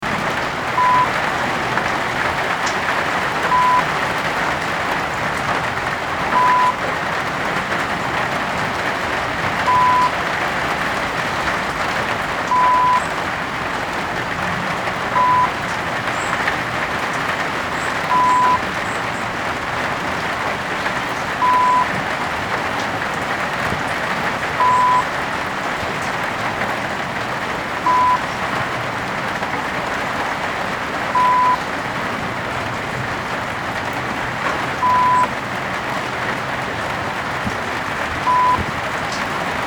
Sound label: Raining Tin Roof